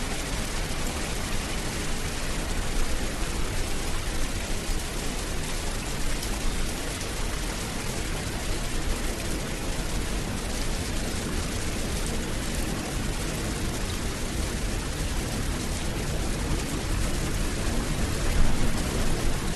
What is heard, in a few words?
Loud Thunder Heavy Rain